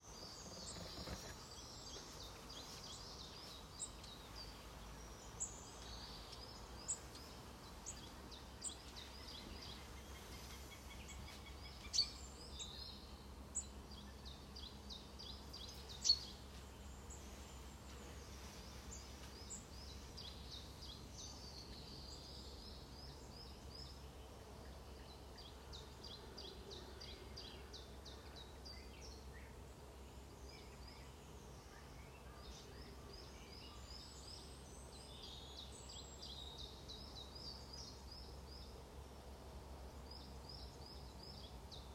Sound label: Birdsong; Blooms Gorse Cottage